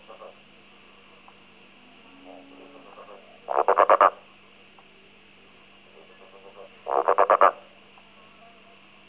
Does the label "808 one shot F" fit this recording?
No